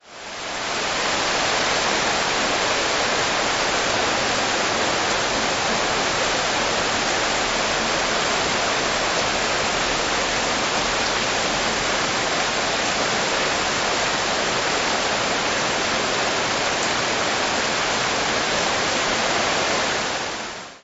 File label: rain